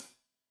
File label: Hat Loose, Hi-Hat, Cymbals Stereo